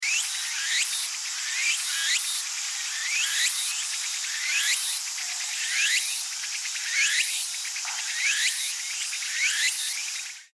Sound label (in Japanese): ツクツクボウシ 複数